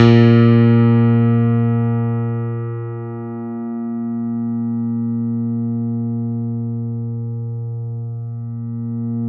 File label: A#2 FF, Piano cp80